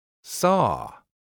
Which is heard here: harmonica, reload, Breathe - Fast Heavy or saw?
saw